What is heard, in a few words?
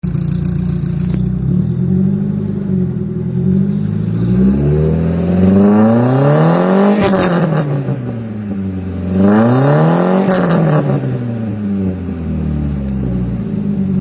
exhaust